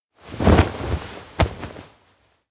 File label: hug; mugi aino; anemoi